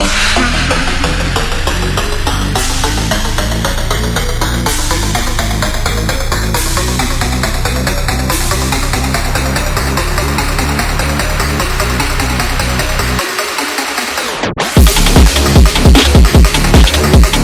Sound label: Instrumental